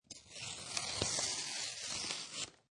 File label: Paper Cut, Paper knife, slice paper, cut paper.; Classroom; Schools